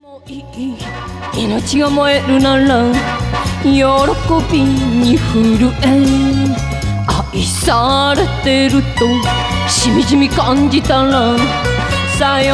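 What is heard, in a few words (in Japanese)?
showa kayou female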